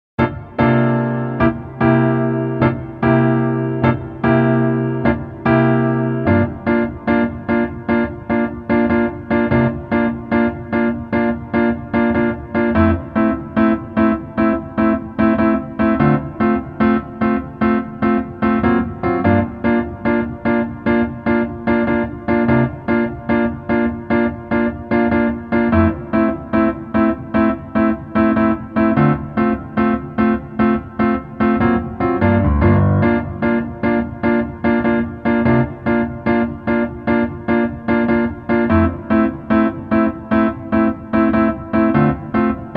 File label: Piano